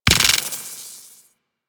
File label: Mortar Mine Drop; Warframe; Venus; Venus Terra Mortar Moa; Abilities